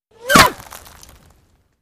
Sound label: Bullet Impacts With Whir In And Impact